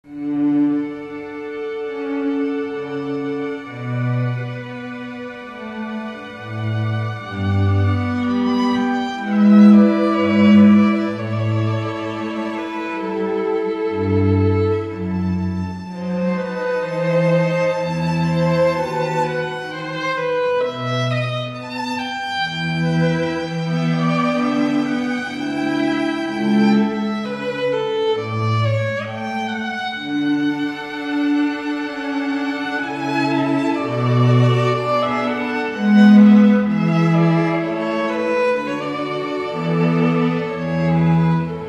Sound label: strings quartet; Air on a G String